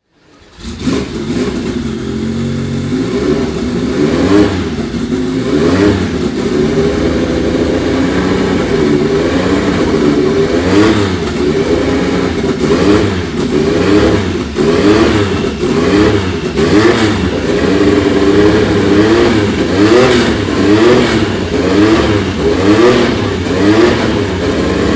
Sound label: Porsche 961